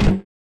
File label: shift down, rally; gmod; gear effect sounds